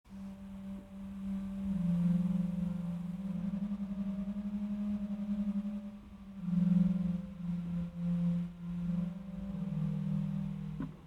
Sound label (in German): Bordun reihe 16 8 4 Bordun 16, orgel